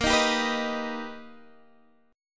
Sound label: B Augmented Major 7th A sharp